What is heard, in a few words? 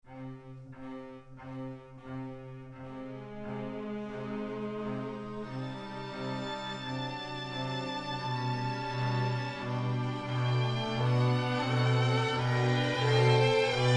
Sync Wavetable Soni